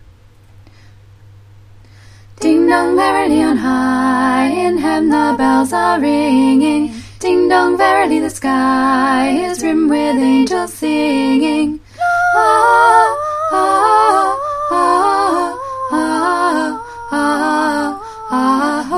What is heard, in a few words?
alto middle part